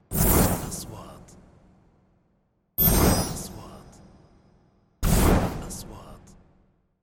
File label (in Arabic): نغمة اندفاع هوائي سريع; transitions